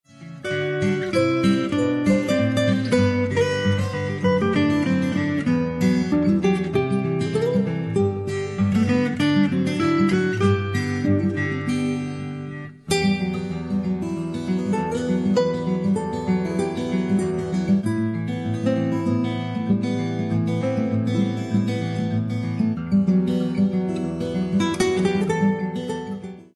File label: acoustic guitar